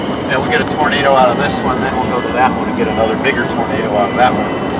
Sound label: tornadoes